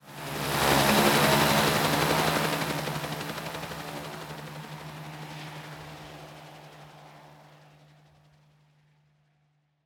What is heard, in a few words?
escape helicopter; teardown; ps5 windows xbox series xs